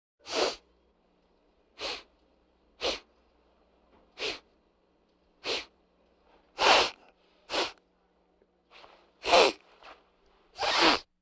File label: Vocal effects breathing Moaning Baby